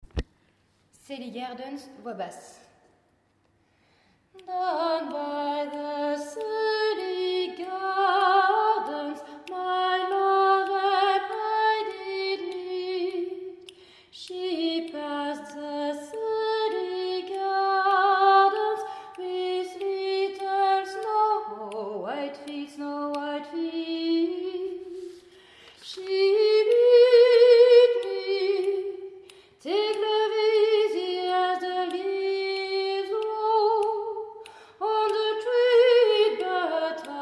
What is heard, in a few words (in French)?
Basse; Sally Gardens